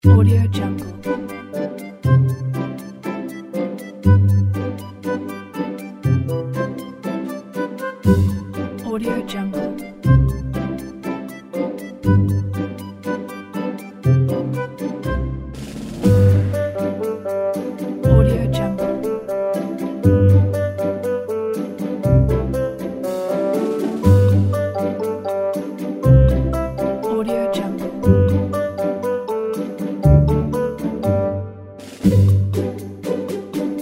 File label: mysterious summer traveling